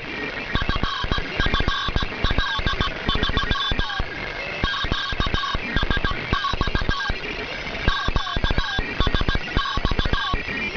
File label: signal gear